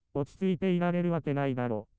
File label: synth